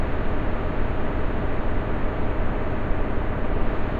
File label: InfiniVerb Noise, sparse soundscape Loops